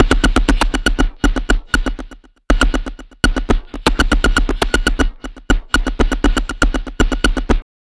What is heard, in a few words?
Structured Rhythm, IDM Rhythms